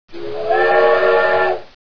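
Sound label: steam horn; steamhrn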